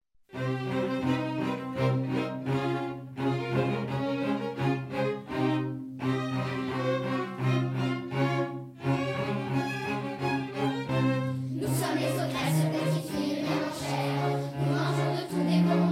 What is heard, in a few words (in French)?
ensemble vocal variété jazz chant du monde